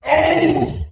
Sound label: Crusher Wound Crit; AnubarCrusher